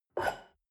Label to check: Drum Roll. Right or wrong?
Wrong